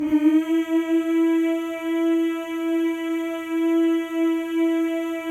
male; Spectrasonics; Symphony of Voices

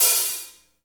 HH HH, HH Real

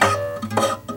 SQUEEK, Nylon Guitar

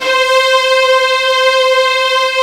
STR C4; AKAI S-Series CD-ROM Sound Library VOL-2

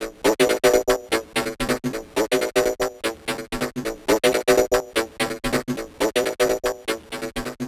Syn Fx Loop 125; Equipped Music

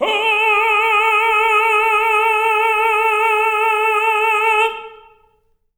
TENOR A4, Voices; Spectrasonics; Symphony of Voices

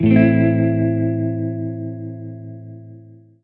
Synths Root C

